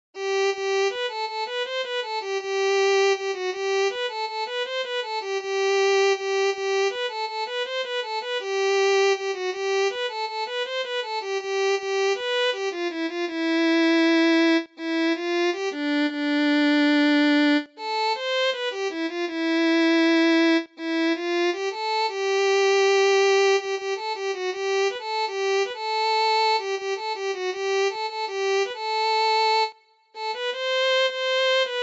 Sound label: Violin